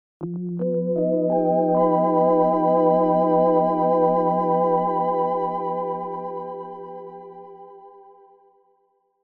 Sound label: Stab; Recorded live at home in Manhattan